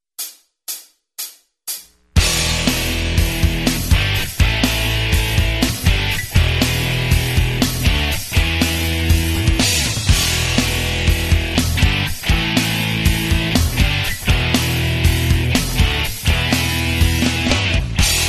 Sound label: Rock guitar; yao gun ji ta